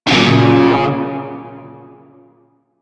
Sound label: Shawzin Hirajoshi Chord; Warframe; Narmer Shawzin